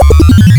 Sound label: Creepy; Joust